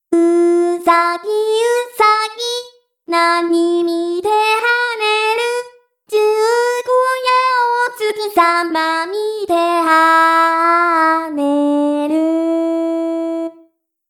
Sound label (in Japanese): Usagi a cappela